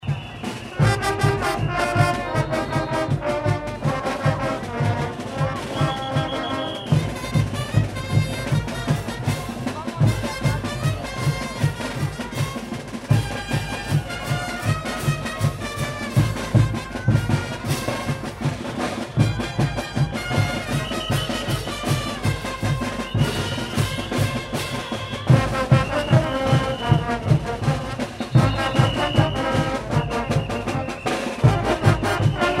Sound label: fanfare oruro